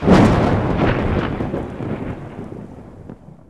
thunder

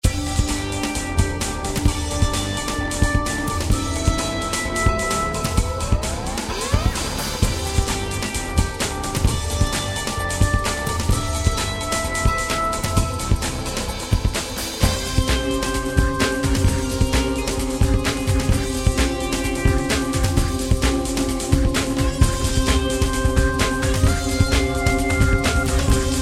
Labyrinth Theme Music

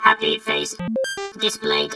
laugh; Cyberworms

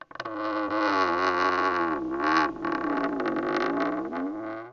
Creaking Tree

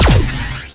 plasma shot

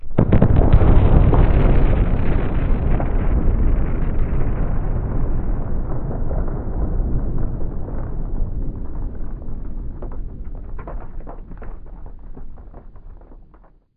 Nuke Explosion

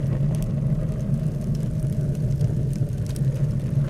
Fire Loop; Worms3D

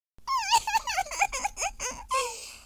lose loop; speech

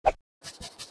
head scratch

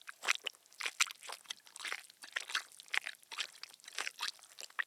Tentacles

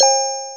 DING

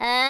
jump

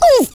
oof; Blues Man

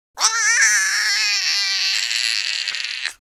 scared; Spanish Inquisition

